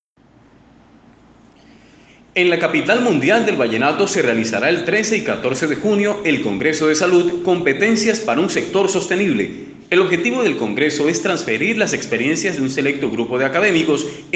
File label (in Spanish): Jingle; 10 de Mayo